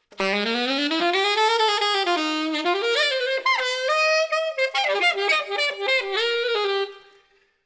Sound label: Music Solo; Music Mink Solo; Costume Quest 2